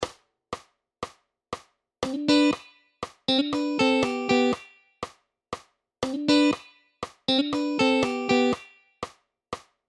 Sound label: G7 sixths riff